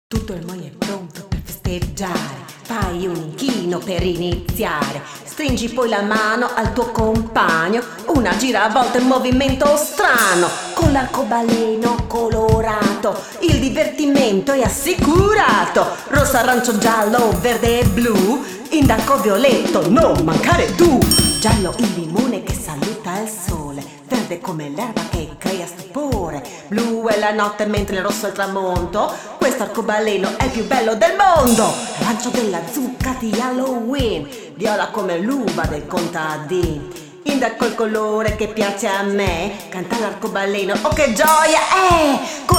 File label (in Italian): ARCOBALENO RAP